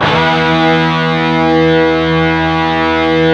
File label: PRS PCSF 3-L, guitars; PRS PWR SLID